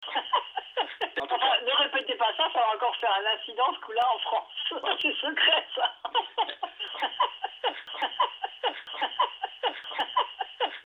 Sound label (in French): sonnerie